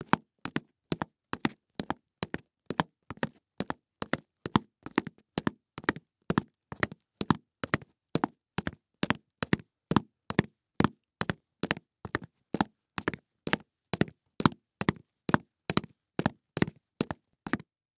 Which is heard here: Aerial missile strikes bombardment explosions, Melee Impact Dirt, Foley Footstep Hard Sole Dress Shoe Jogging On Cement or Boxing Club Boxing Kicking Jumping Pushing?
Foley Footstep Hard Sole Dress Shoe Jogging On Cement